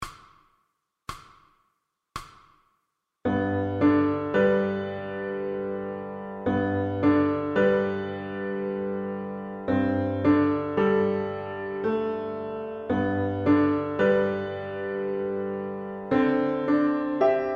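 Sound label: Violin Scales; Pre-Grade Higher; piano accompaniments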